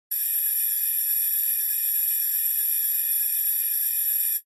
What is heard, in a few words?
Alarm Beep Pulses